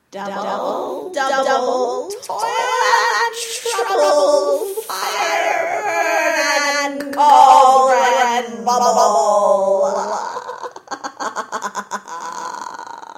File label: cauldron; pot